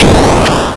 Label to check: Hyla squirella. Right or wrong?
Wrong